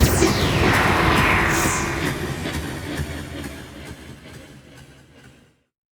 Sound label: FX; LiLA'c Records PsyTrance Sounds 01; LRP1 FX12